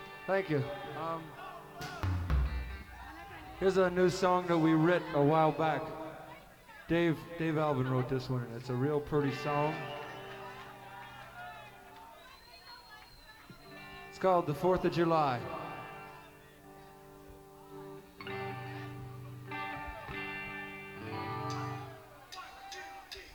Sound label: Chant; Chestnut Cabaret Philadelphia 7-30-86